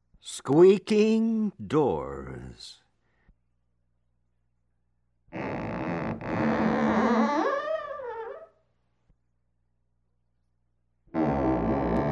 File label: Halloween - Creaky Doors